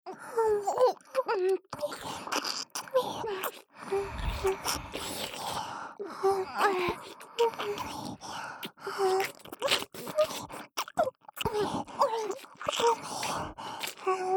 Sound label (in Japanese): 右耳キス舐め; ラブマチオ; オナサポおまけトラック